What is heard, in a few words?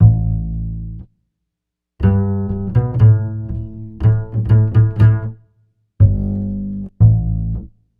Upright Bass, Ukraine Gypsy; Sonic Foundry; Continental Drift Aftershocks